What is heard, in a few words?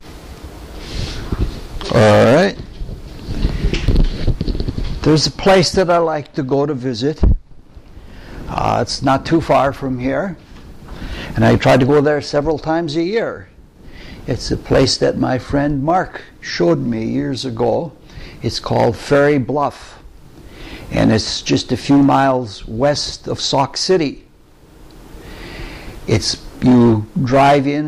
Octaves